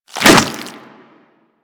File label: Infested Ancient Swing; Warframe; Puss Ancient; Attacks